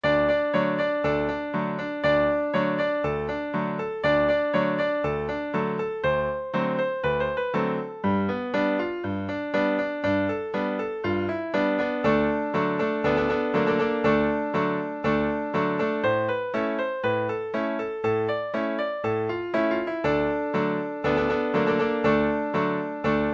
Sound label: bluegrass stomp